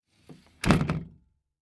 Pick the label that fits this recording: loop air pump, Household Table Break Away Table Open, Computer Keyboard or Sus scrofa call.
Household Table Break Away Table Open